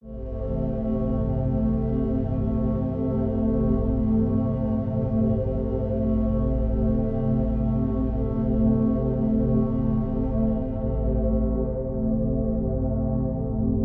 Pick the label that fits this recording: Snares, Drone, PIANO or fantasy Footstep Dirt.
Drone